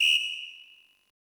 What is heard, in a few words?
Whistle Verb, One Shots, Drums, Misc Percussion